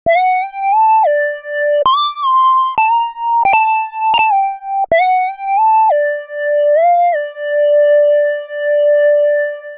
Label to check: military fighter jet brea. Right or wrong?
Wrong